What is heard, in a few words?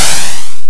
Missile Launch; PATRIOT